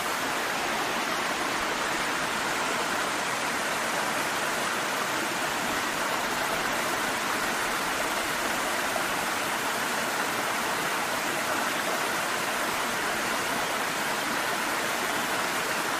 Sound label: waterfall, nature